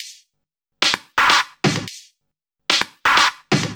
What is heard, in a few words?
Minimal Loops